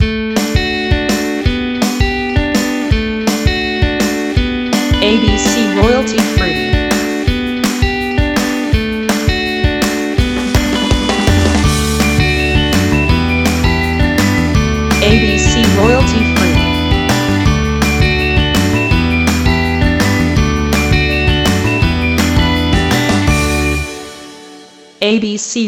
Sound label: Happy Commercial short